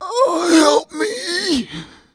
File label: PAIN HANG; Messiah; HARPOONED